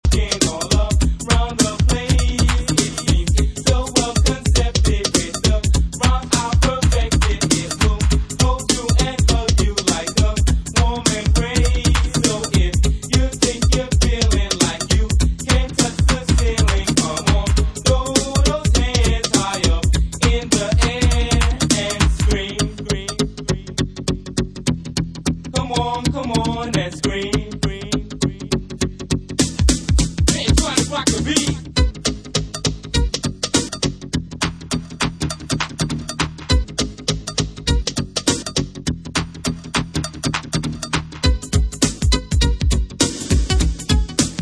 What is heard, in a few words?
Scream